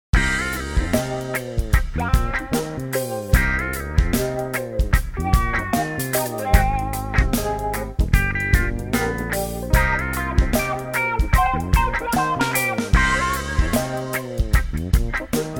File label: dark reggae